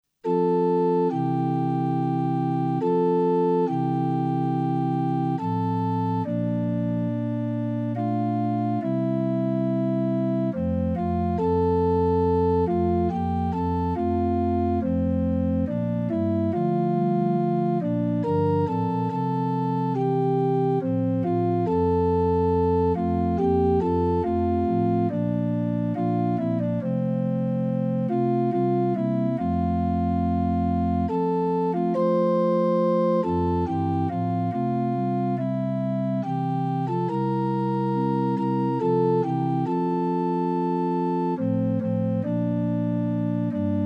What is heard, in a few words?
Calon Lan Melody; Wedding; festival choir